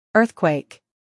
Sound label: earthquake